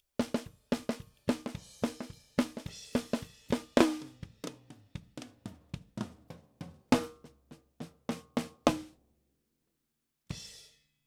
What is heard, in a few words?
SNR top